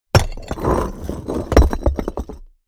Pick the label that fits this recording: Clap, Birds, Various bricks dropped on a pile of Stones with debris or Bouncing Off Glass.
Various bricks dropped on a pile of Stones with debris